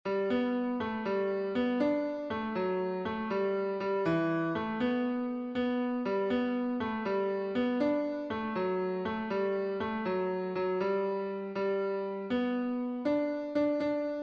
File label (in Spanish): tenor, himnos en piano; 94 JESUS EL MESIAS; cantos del camino